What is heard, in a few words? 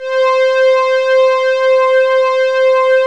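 Juno brass, analog synths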